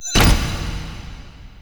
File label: Vaults Stairs; Warframe; Entrati